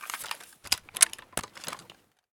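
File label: l85 grenade launcher activate, weapons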